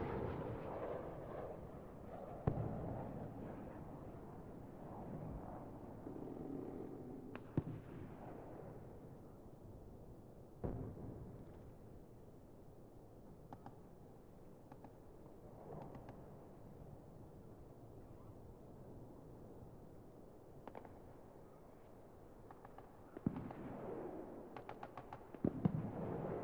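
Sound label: ambience training ground interior closed room; Titanfall 2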